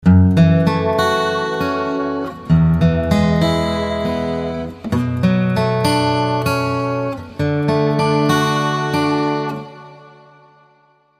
Guitar Flanger ITC Classic Hall

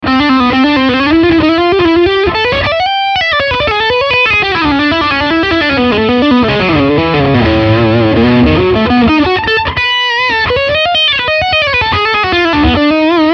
High Gain Lead

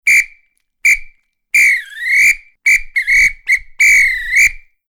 Whistle Referee; Orpheus

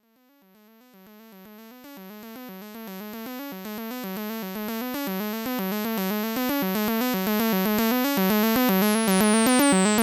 A109 high Res sequ

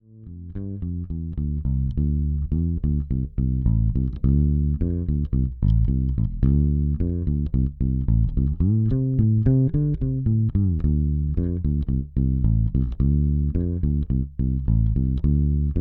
Fretless RockPop Groove

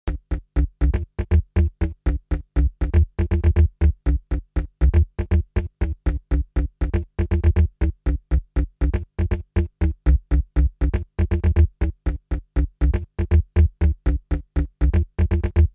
Log Bass

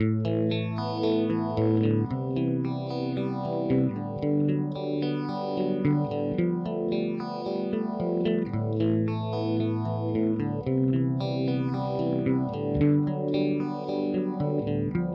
Zelzah 4 Stage Barber; Strymon

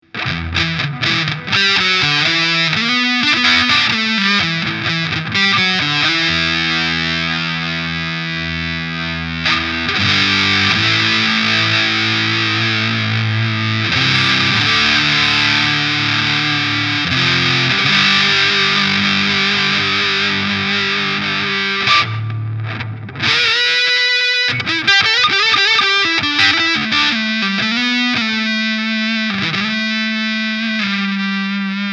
Big Muff Sustain full Tone; Rams Head; 14h

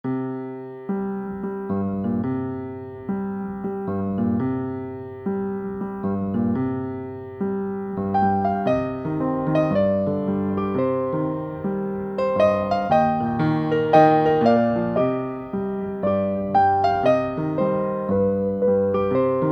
Nordic Piano

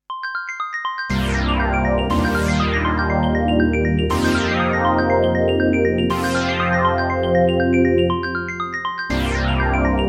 Roland JX 08

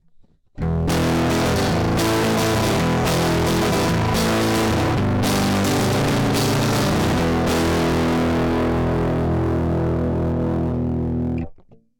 E Gtr Direct; Warm Audio TB12

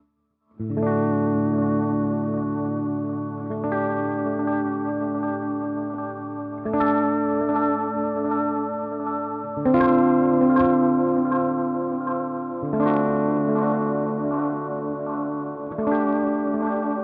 Thermae Modulation Reverb; Chase Bliss Audio

